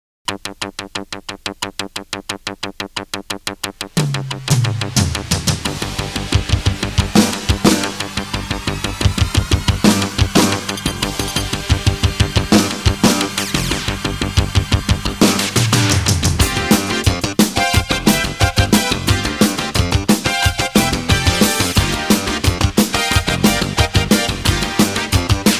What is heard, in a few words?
sports car jingle